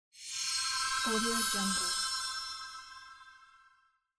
shining; tibasfx

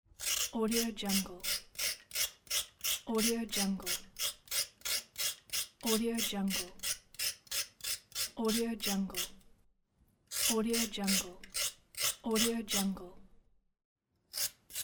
carrot peeling

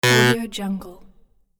robot bee

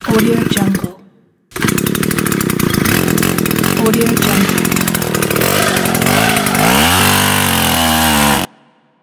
chainsaw

